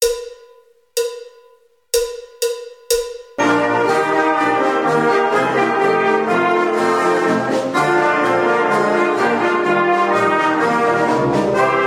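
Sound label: March with Pickup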